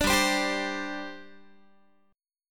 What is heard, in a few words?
G Suspended 4th G down Guitar; Gsus4 strummed